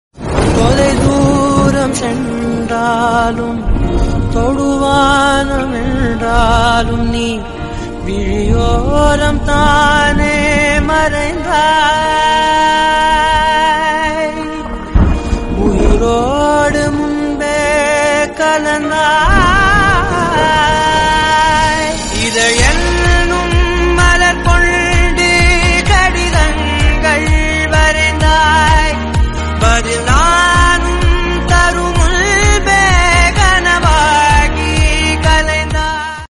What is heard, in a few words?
Tamil Song